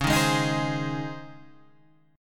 C sharp Major 7th Suspended 2nd C sharp, Guitar Half Step Down; chord